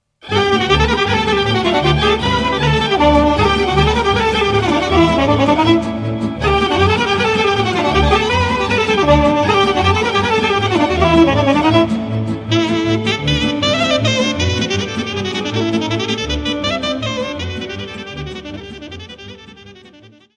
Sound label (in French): Brauri banatene - Roumanie